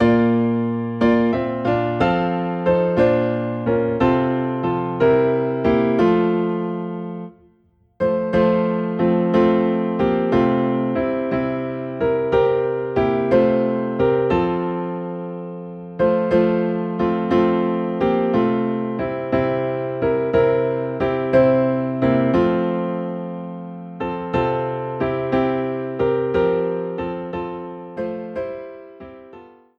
Piano in F

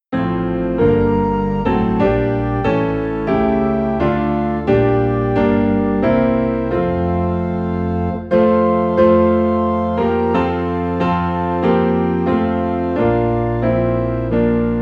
Piano and Organ in F; Away in a Manger; christmas

